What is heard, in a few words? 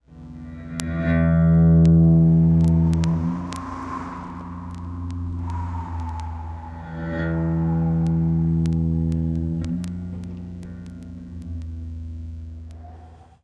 ghost wind